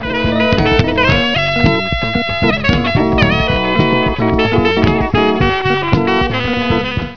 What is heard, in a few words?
radio sax